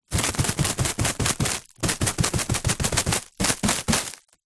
household cling film wrap sheet stretched out hand taps